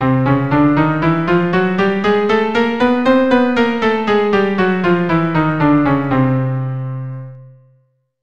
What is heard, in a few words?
chromatic scale